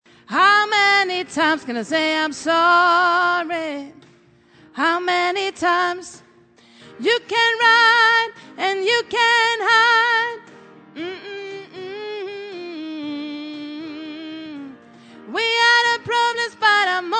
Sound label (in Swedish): sopran